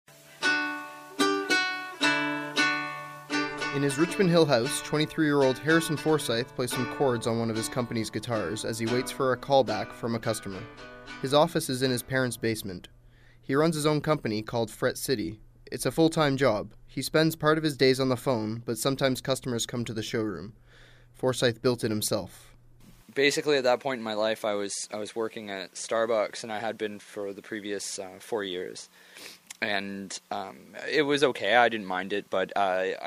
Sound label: guitar